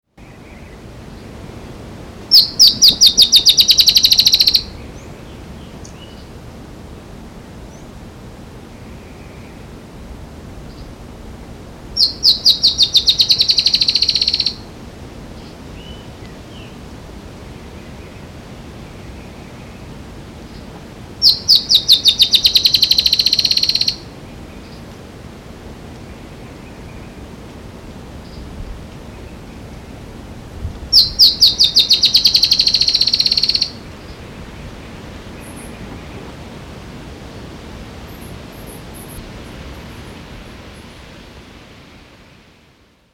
Drymophyla malura; Itap da Serra; SP

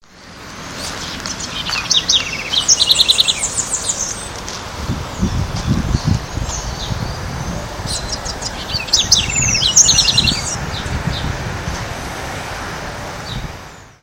Troglodytes musculus; Pq Moinhos de Vento POA; Silveira

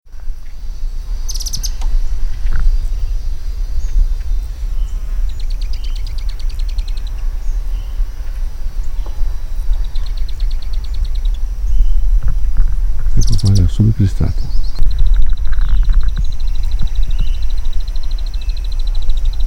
Serpophaga subcristata; N Cunha PESM

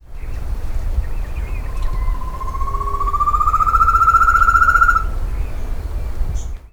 Chamaeza ruficauda; Mro Agudo; S Ant do Pinhal; SP; Silveira

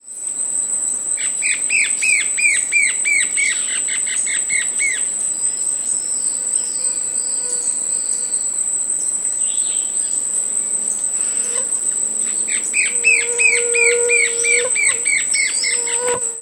Myrmotherula axillaris; Faz Country BA; Silveira

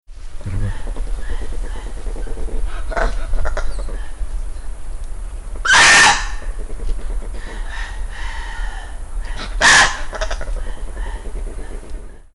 Brachyteles arachnoides Muriquis; SP

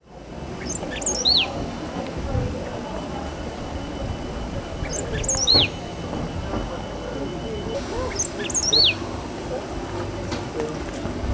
Embernagra platensis; Canela

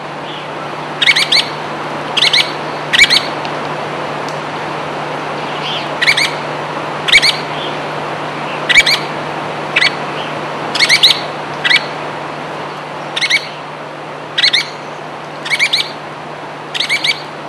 Melanerpes flavifrons; Iguacu NP; Brazil; A Silveira

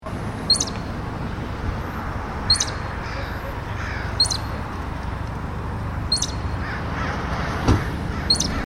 Otospermophilus beecheyi alarm; Monterey; CA; USA

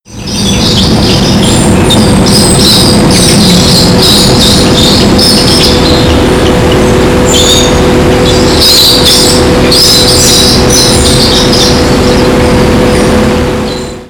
Turdus subalaris; PN Itat; Silveira

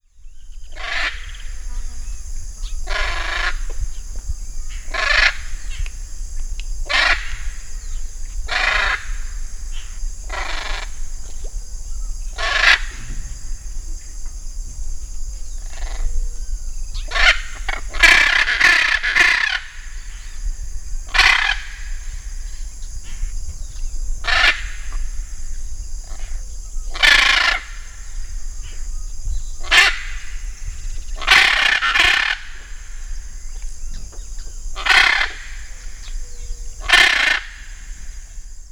Anodorhynchus hyacinthinus; R Claro; Pocone; Silveira

